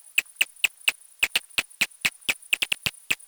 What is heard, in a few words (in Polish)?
mroczek pozny, chiroptera